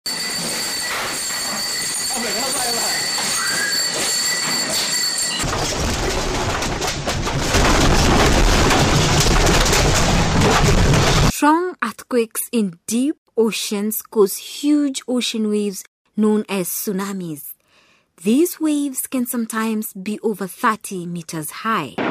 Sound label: tsunami; GEOGRAPHY